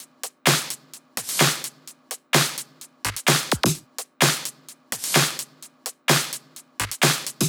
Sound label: 128BPM Drums; Vengeance Samples; Body Kit